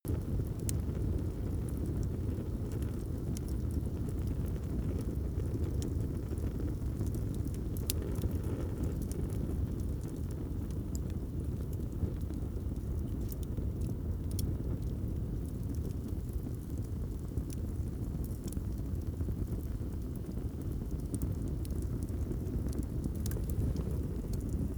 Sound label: torch medium loop